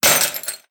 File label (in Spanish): Cristal Rompiendose